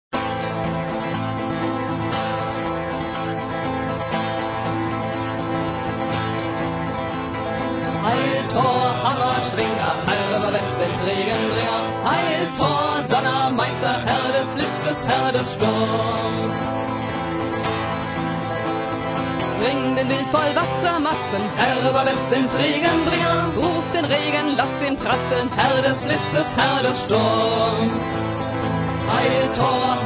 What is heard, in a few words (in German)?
Regengesang; callofthegods